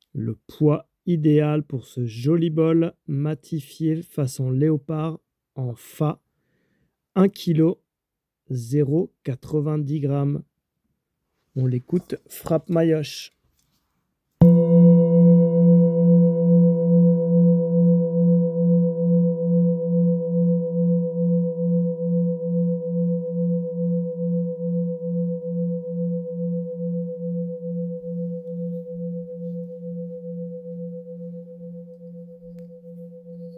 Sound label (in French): Bol Tibetain Martele 7 Metaux Matifie Note Fa